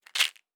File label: Pills; Survival Game Sounds - Lite Edition; Objects and Items